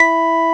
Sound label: ORG E, M1 ORGAN; Best Service ProSamples vol.10; House; AKAI